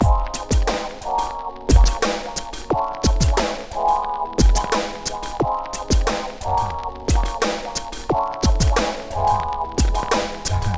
PHATT BEA; E-MU Formula 4000 Series Vol. 1; Hip Hop Nation; PhattBeats88-90

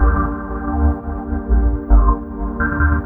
pad, Jungle; E-Lab; XTC Files of Jungle

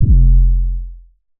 Dusty 808 F, hip-hop drum kit; 99Sounds x Monosounds; Moon

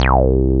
BAS, Eurotech; Sounds Good

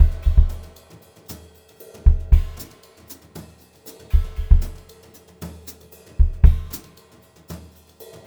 HOTROD VARI, Brushed Drum Loops

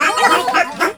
RITUAL; Spectrasonics; Heart of Africa 1; RITUALS

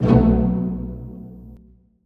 Orch Stab

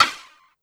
RIM, 160 BPM, Drum & Bass; Ueberschall; Drum & Bass Flash Trackz

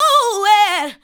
ADLIBS 90; Ueberschall; Jam Box

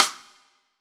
rim, drums; Yamaha SY85

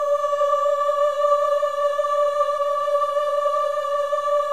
VIB M D5 -R, Symphony of Voices; Spectrasonics

